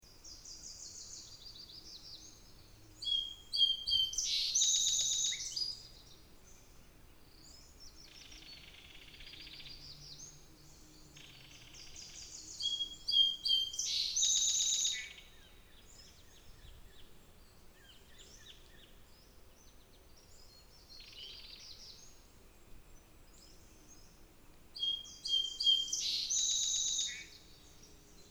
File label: 9am birds